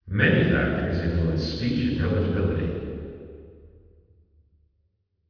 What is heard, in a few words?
church 2000ms